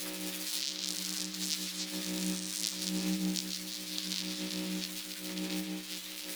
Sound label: Shield Orb Loop; Powers